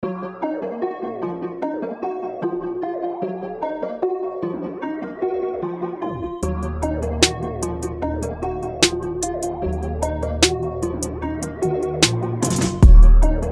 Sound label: URBAN